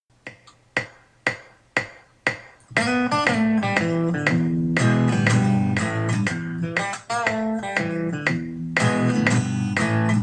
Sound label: boom boom lick, guitar